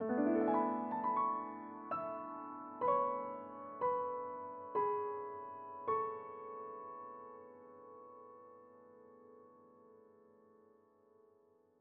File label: Fanfare; StickerClone